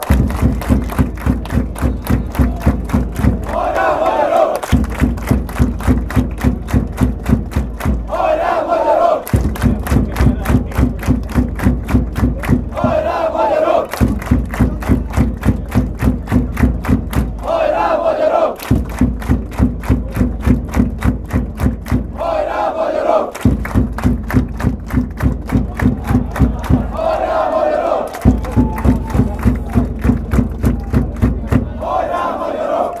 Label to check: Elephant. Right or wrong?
Wrong